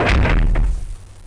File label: CLUNK; Gunlok; Robots